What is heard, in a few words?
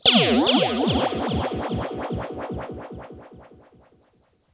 RIPPLES R, Funk Guitar; 90 s Sample CDs; Zero G